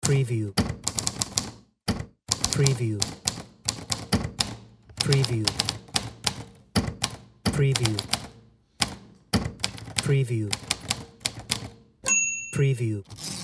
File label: typing retro